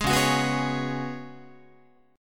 Db 7th Suspended 4th Db down Guitar; strummed

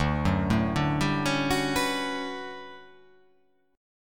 D Major 13th D down

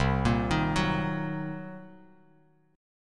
C Minor 7th Flat 5th, Bass Standard; Cm7b5 arpeggiated; JGuitar

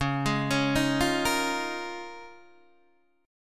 C sharp 6th Add 9th C sharp down Guitar DADGAD

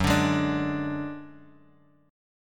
F sharp Suspended 2nd Sharp 5th, Guitar, Open F; strummed; JGuitar

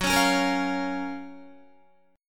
Gb Major 7th Gb Guitar; GbM7 strummed; JGuitar

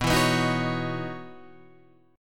C sharp Minor 11th B

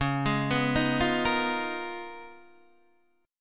C sharp 13th C sharp, Guitar, DADGAD

